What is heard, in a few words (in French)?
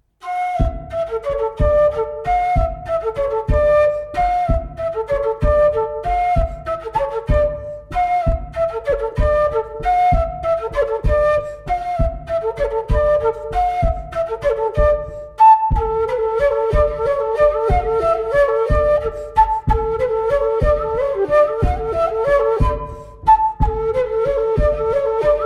Montagnarde; Recueil Laussedat